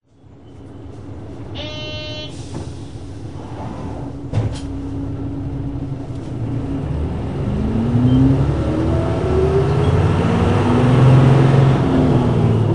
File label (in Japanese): 走行音, 日野 U-HU2MMAA; 仙台市交通局; 霞の目; 電力ビル前→商工会議所前